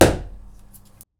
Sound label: Wall hit; neuro; InsideInfo; Real World Sounds